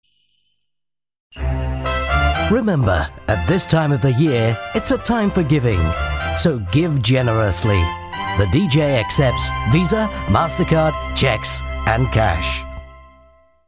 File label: F Christmas Jingles